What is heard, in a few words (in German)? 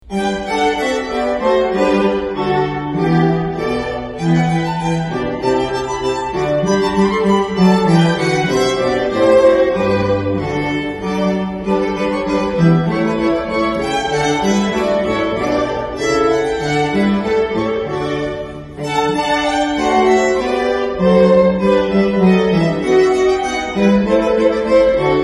Rigaudon Streichquartett; Haendel